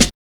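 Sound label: snare drum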